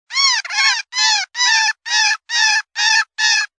Baby Cottontails

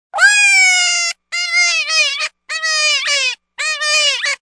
Screaming Cottontail

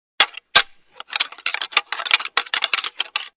Rattling

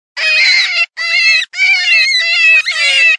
Cottontail; Cottontail Buffet

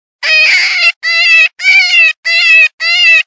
Desperate Cottontail, predator calls

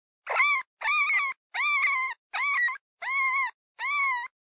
Rodent Squeaks

